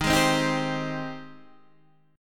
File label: G sharp Major 7th D sharp